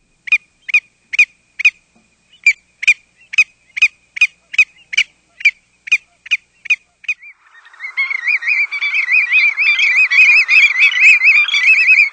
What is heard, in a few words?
Black Oystercatcher; Birds